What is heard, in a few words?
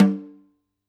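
Snare Off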